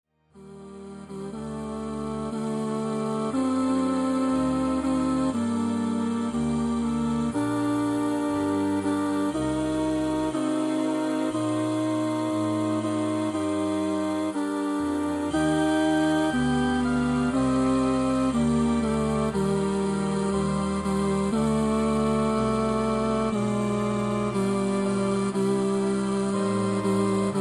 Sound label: stabat mater deel 2 tenoren; rheinberger; oefenbestanden koor practice choir; J. Rheinberger